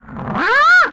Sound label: hunter pre attack; video game; source engine; portal2; outland